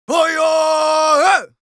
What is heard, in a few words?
Yells, Viking Vocal